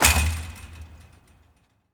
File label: wall fence; Golden Crayon Sounds